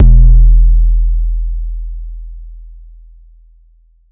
boom, Kicks; ModelCycles

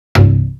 STRINGS delay; SONIC ELEMNT; SONIC EL YES

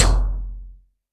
sfx perc

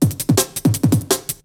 BREAKBEATS, TECHNO

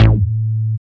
DR. LOOP, BASS; X-Static Goldmine 3

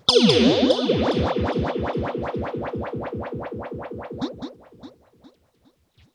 RIPPLES L, Funk Guitar; 90 s Sample CDs; Zero G

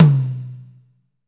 TOM, Ballad; Northstar - Drumscapes Roland

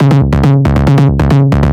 bass licks, techno, 138, c#; instrument-loops

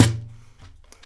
PATUM, Percussion; InVision Interactive; Percussion Slam

